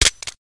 spoon, gren; svencoop; ins2

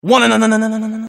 power up; svencoop; FZSaySound

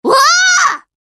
ooo; svencoop; FZSaySound

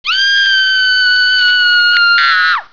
scream, nurse; svencoop; hunger; znurse

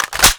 M1921 mag in, tommygun; svencoop; hunger

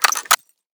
kar98; svencoop; ins2

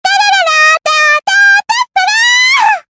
victory; svencoop; FZSaySound

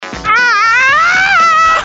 ahhhh; svencoop; FZSaySound

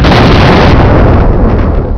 explode, tank; svencoop

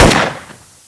ap9 fire; weapons; svencoop; hunger; thweapons

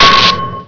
cbe hit; weapons; svencoop

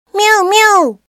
mew; svencoop; FZSaySound

